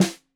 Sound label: Snare 65 Reg Stereo, Deeply Sampled Drums